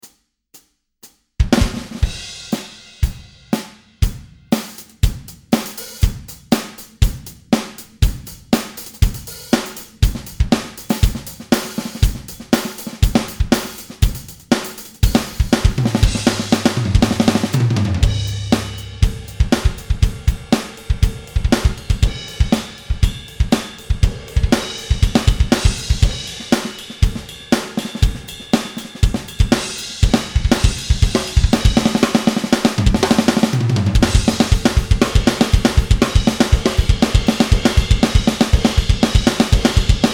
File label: Only Drums